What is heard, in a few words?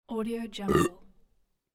burp